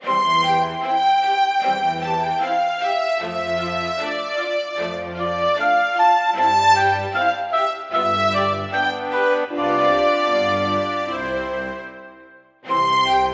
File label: Classical; ZTE; Nubia RedMagic